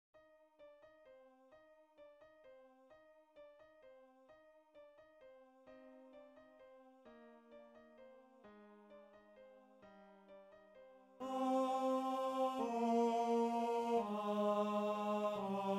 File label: bells, ttbb